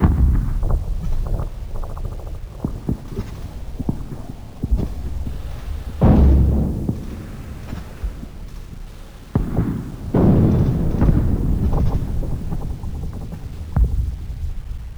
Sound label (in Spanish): ambiente disparos loop